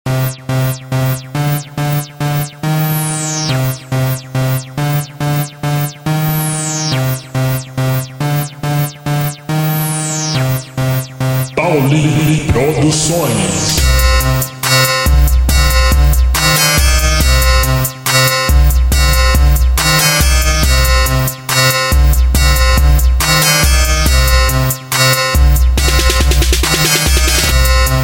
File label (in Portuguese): base rap